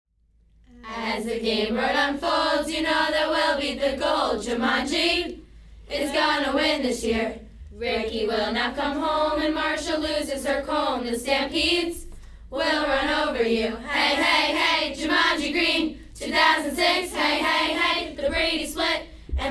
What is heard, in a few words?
cheers